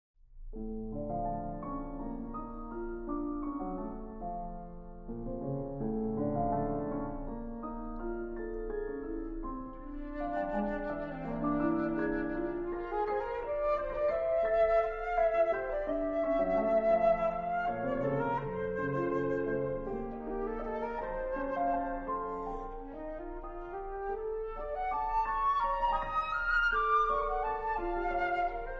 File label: Sonatine for Flute and Piano